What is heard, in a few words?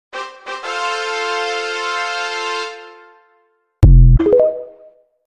trumpet success